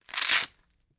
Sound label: Touch Fastener Rip; Home & Office; 1001 Sound Effects